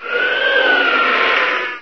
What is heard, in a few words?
die; controller; Source Engine; bshift